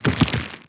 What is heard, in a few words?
subtle, zombie blind, foley; creatures